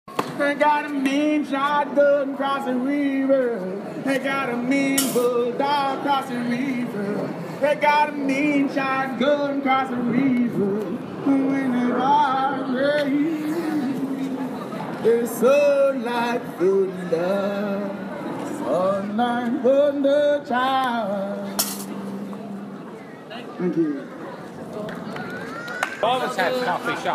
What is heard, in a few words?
busker in Cork Ireland outside St Paul's